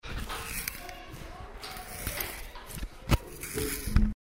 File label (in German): spitzer